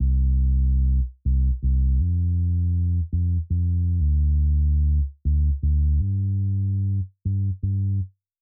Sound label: bass